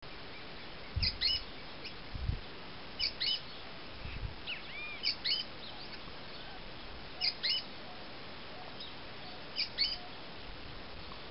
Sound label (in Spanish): pijui fren gris